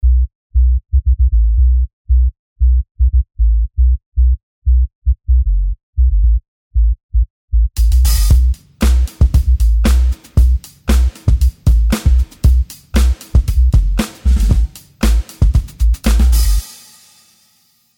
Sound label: real sub bass square sub eq; mixing